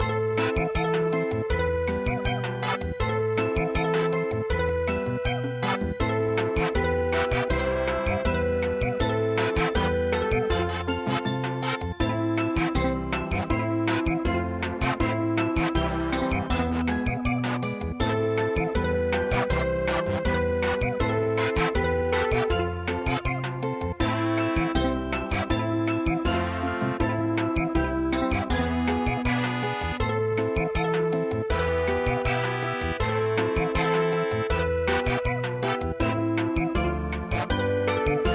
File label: Samba, midi; Sakura